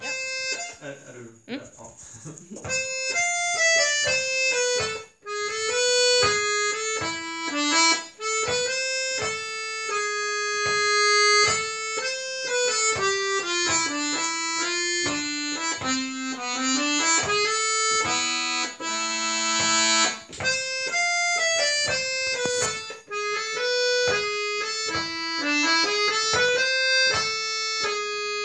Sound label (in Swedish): Hela stämman brudpolska